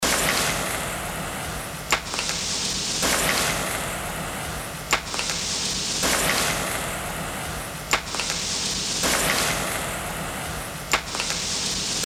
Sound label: noise loop